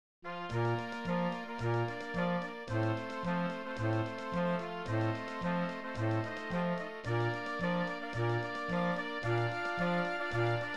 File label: Bb Tuba